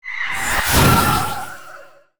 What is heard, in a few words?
war attack